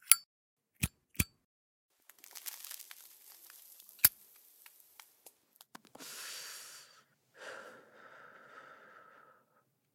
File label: smoking lighter; Zomboid; Smoking Sound Overhaul